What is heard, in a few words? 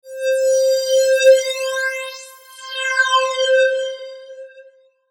Sweeper Synth Phaser; Sweeper Sound Effects